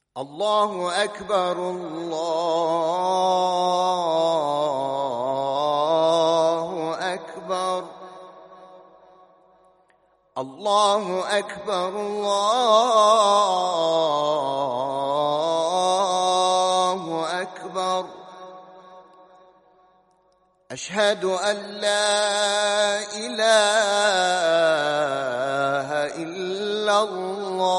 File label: azan